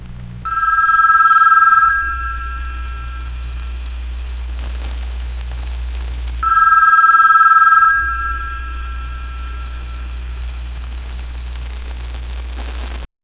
vintage landline phone ri

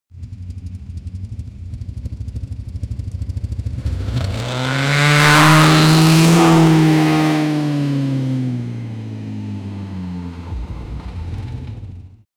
v8 muscle car driving by; engine sounds

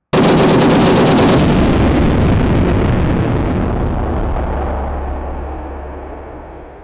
a missile launcher being, launcher

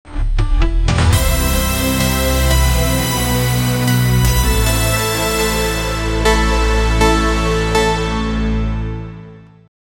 epic intro with gaming

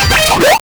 fighting game block

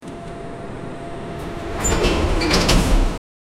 a subway door opening sou

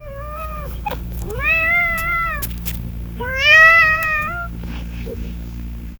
soft pattering paws kitte

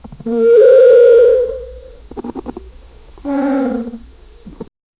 giant monkeys labored bre

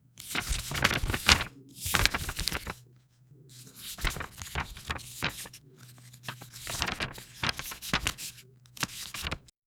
open book crisp paper

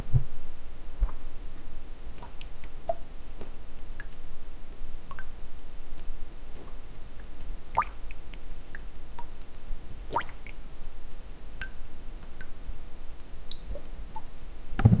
water drop in empty room; interior

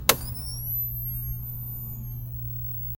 the sound of an old, home appliances

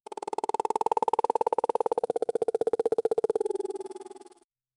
animal

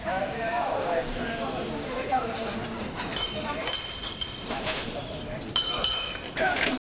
noisy crowd with some whi, glass break

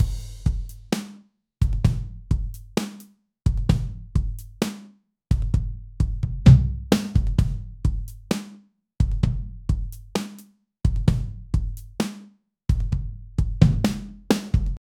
calm clear drums

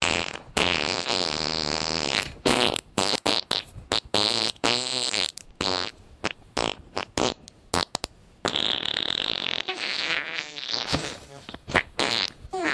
an extremely wet fart; sound effect; body sounds

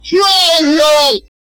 fat kid eating

